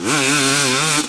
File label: Mid, Engine